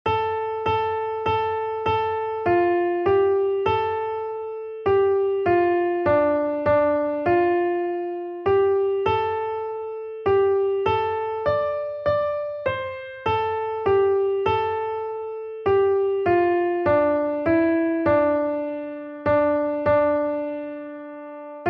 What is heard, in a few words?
melody; i waited for the lord